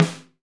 SD, Real Kit; 90 s Sample CDs; Sound & Vision - Gigapack I CD 1 (Roland); Real-Kit m16

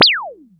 Retroshot, Korg Radias

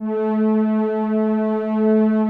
Prophet 5 PAD A4

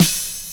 SD, Dance Mega Drums; Best Service

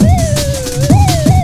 FXBEAT

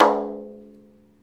MalaiyuDrm

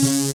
SYN, DANCE SYNTH

